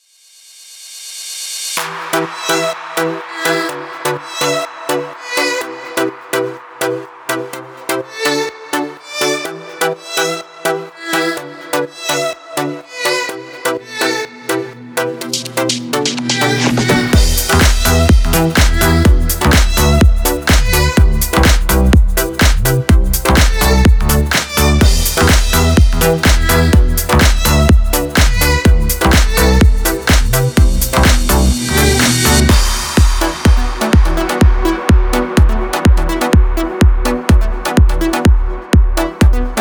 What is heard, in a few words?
house electronic techno; sinyal mesaj1; By Burak Sound Bank; house electronic techno vol 6